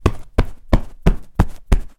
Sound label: household sofa small cushion hit with hand multiple times